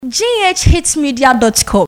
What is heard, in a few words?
Jingle; Ghhitzmedia